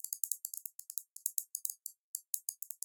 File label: modular ui tech typing; soundmorph